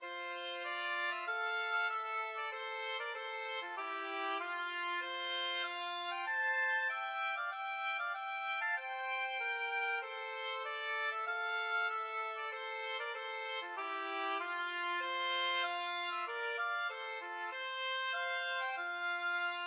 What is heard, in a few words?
Hail to the Chief, oboe duet